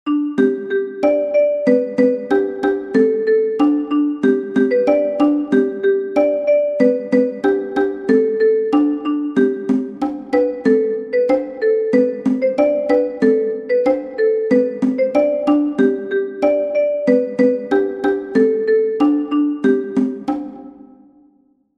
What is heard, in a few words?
Cajon; Es tanzt ein Bibabutzemann